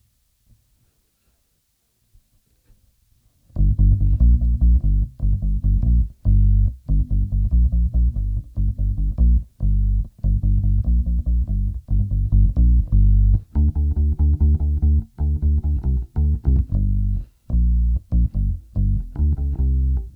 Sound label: Bass